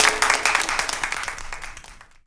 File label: APPL, XP APPLAUSE; Best Service - XXL Production Effects